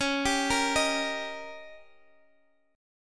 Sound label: D sharp Major C sharp, chord; D#/C# arpeggiated; JGuitar